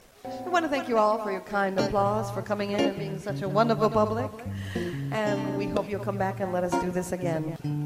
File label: jazz; Puerto Vallarta; Jaztec Entertainment Enterprises